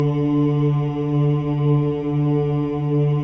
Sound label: CHOIR OOS, D3; Spectrasonics; Symphony of Voices